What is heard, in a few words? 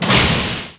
kchunk, doors; Heretic II Demo